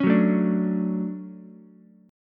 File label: D-Major 7th, D, Ukulele; strummed